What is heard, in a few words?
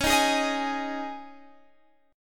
Db Suspended 2nd Db Guitar Standard; 21,18,18,20,21,x; strummed